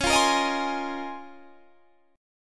A sharp Minor 11th

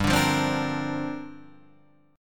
G Minor 7th Add 11th; Gm7add11 strummed; JGuitar

